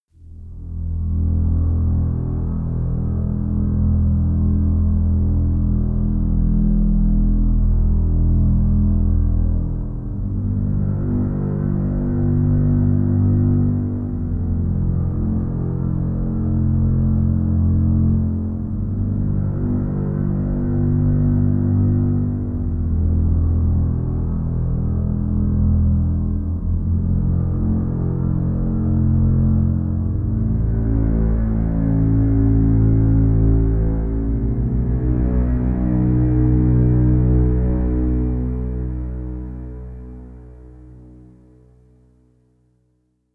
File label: double bass pad; flaechen